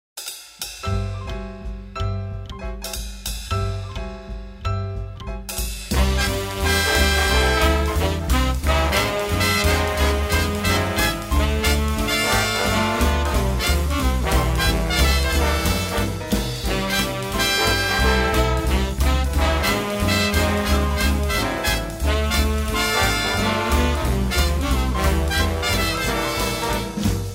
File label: Vintage Jazz Big Band